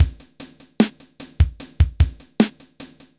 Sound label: hiphop